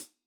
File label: hihat closed, gretsch kit